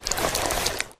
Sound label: longmove; halo; flood; elite h3